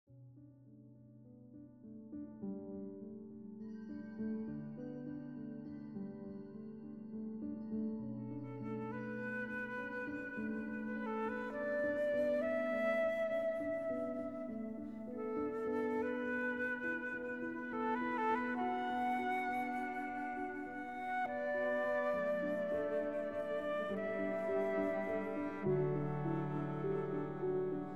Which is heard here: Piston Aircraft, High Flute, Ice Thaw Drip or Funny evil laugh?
High Flute